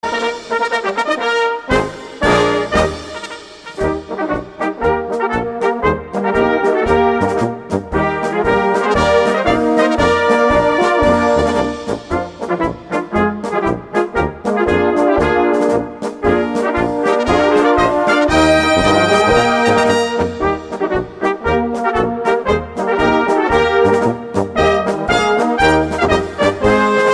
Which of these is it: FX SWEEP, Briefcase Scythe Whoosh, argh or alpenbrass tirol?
alpenbrass tirol